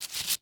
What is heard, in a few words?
Surgical Tool Pick; Medical; Blastwave